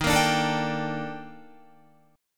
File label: Eb Major Sharp 11th Eb Guitar; EbM#11; strummed; JGuitar